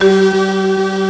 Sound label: BELVOIC; East West; Global Sounds